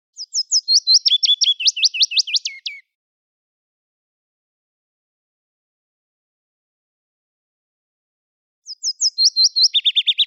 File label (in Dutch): Fitis; Kroondomein